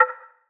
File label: perc metal; Hip-Hop; Drum One Shots; SD CAICOS Hip-Hop Sample Pack; Slate